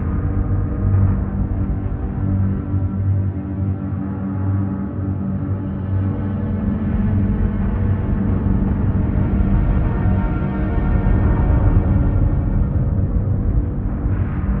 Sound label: ambient; spawngirlsounds